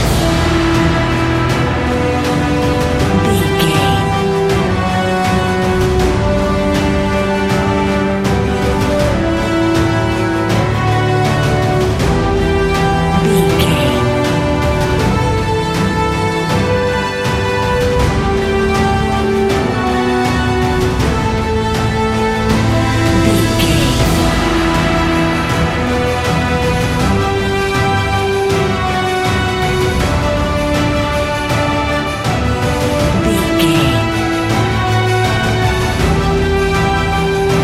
battle epic cinematic loop

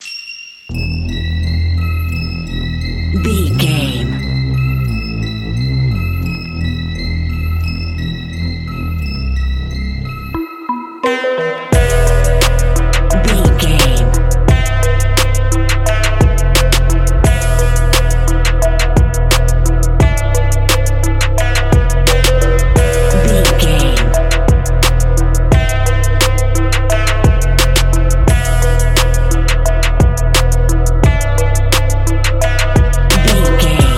powerful trap

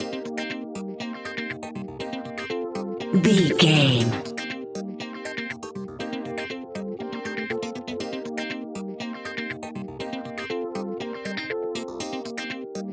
funky menu

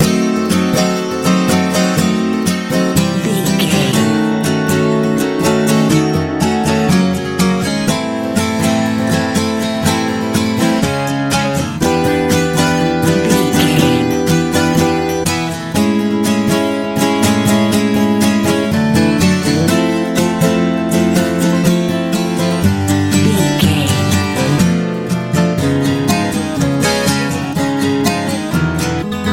blooming warm easy campfire

